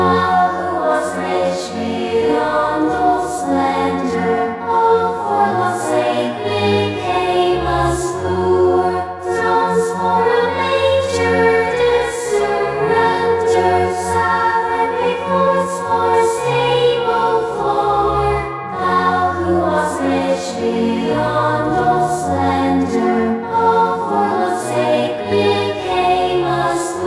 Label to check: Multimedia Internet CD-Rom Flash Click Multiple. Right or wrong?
Wrong